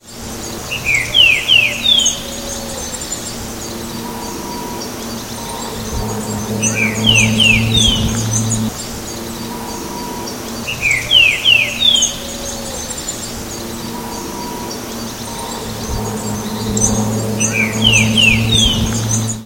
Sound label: Saltator similis; Pt Ballena; UY